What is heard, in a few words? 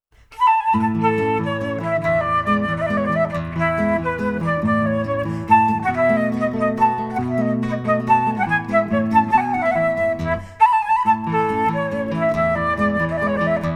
adagio minuet